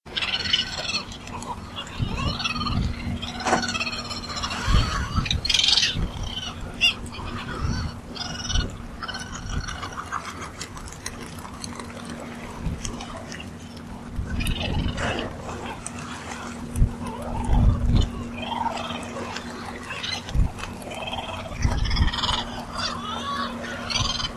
Cape Petrel, Elephant Island; Pritam Baruah

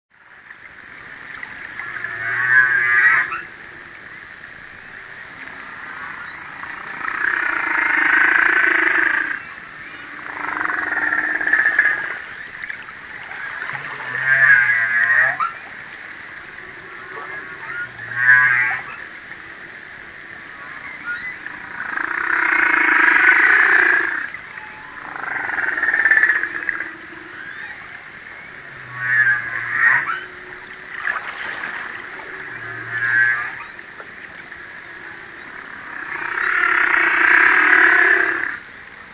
humpback

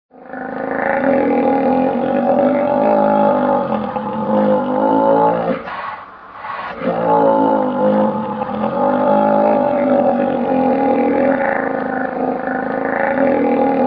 alligator

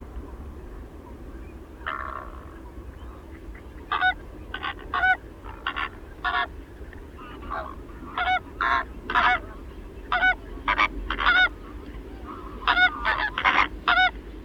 Flamingo

